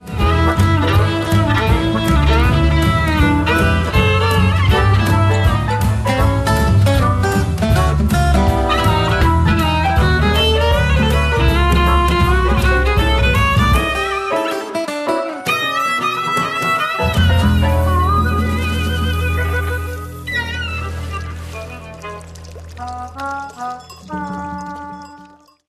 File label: Swing